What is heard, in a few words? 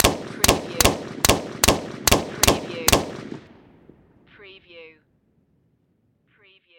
Machine Gun M16